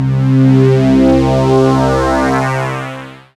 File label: SYN VCF, SYN Analog; Sound & Vision; Gigapack I CD 2